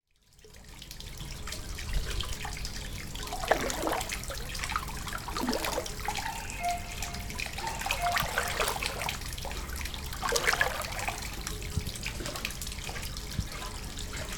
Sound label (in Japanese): asiyu kamisuwa eki; 足湯（上諏訪駅）; 茅野市民館